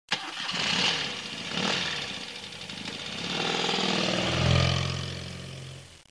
car start

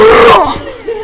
grrr

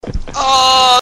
ooooooo

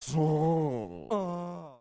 aahhh

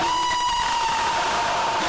femur breaker second scream

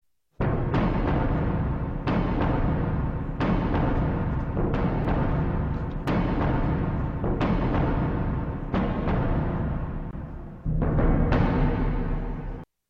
suspense

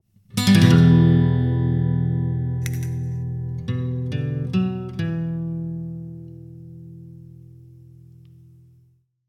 sting; tuxedo mask

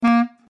clarinet

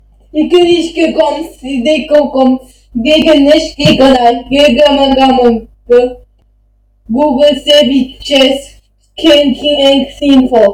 pigeon

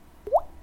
bubble

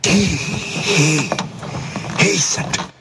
bubbles

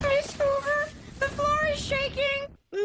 floor shaking

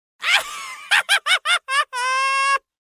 wild laughs male

